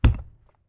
Insect cage put; anemoi

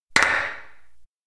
cracker; anemoi

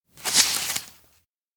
diary page turn; anemoi

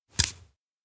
receive; anemoi

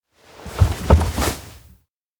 lift heavy; anemoi パッケージ版

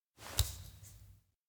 touch crystal; aino; anemoi

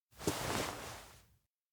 turn; aino; anemoi

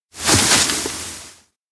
dive into straw; anemoi

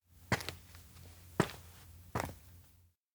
steps indoor adult house step in; anemoi

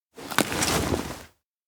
gasmask pull out; awayuki; anemoi パッケージ版 1.1

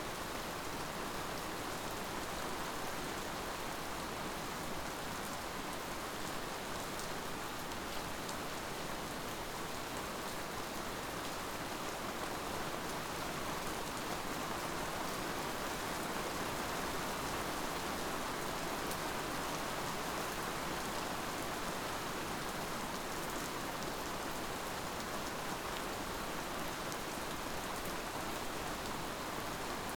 outdoor janitor room night rain strong; anemoi

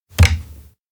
battery place; anemoi

